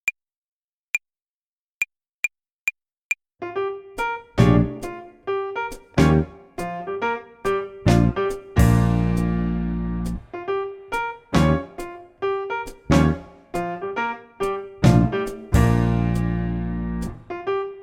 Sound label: Blues, Play-along, Klarinette; Jazz Club Klarinette